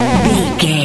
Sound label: retro teleport warp effect